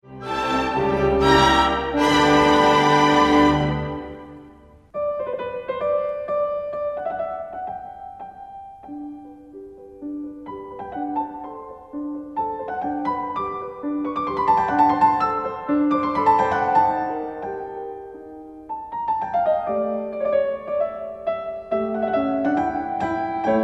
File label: Piano Concerto in G major; Mozart